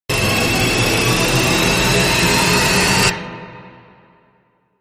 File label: Dramatic Sting